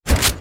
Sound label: shotgun reload